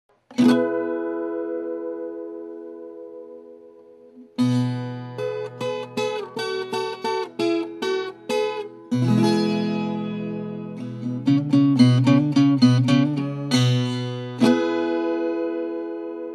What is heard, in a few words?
viola